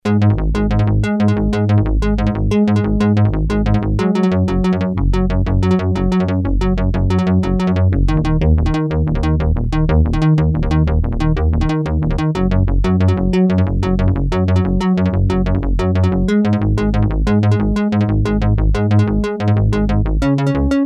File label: arp, yamaha dx 5